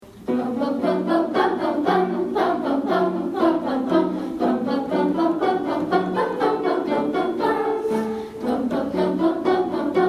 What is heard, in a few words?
in the hall vocal reflexio